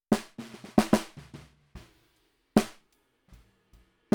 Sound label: SNR bot